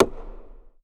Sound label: Circle Wood Surround Rimshot, Drum Hits; Aberdeen Music Hall; Music Hall Percussion